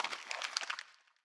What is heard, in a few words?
Crumpled Paper